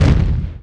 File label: hit hull; Dreamstars